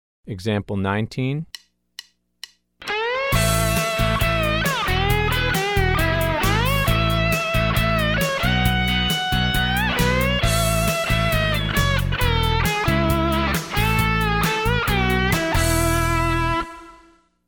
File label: Country Rock